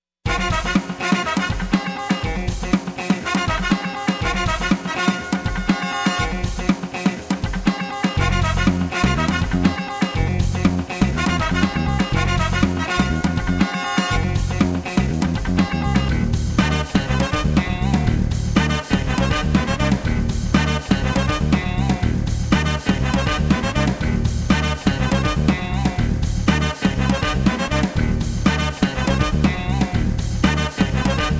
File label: Instrumental; Placement Music; PM USA